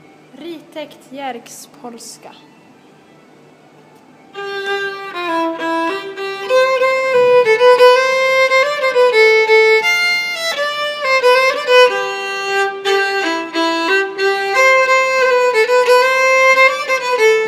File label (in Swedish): polska; Ritäkt Jerks